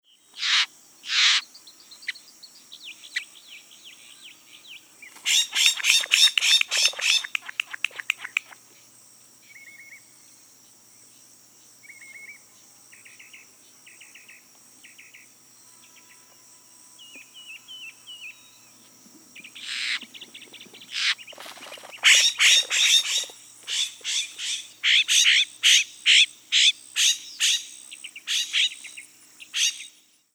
Florida Scrub Jay Hiss calls click calls and weep calls